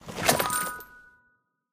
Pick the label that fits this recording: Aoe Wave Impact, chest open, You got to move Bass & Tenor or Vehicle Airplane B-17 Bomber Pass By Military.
chest open